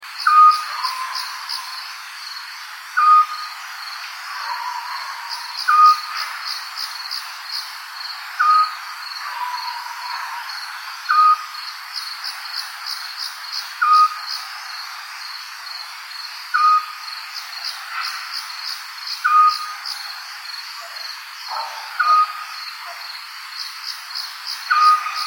night bird sounds

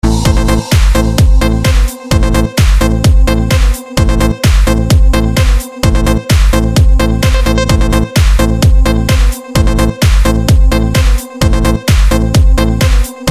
tone angel

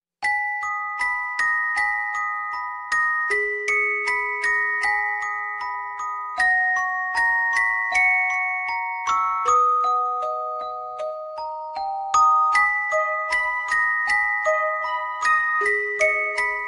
sad love ringtone

